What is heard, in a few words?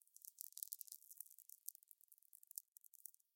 TV Screen Static; SoundsCrate